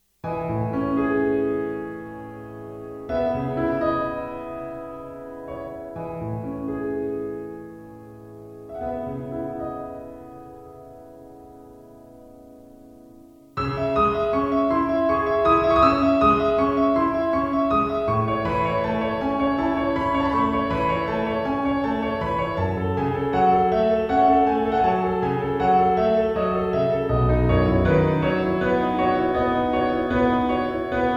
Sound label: piano pop